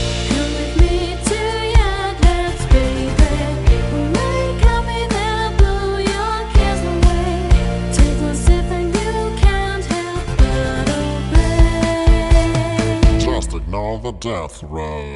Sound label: Chorus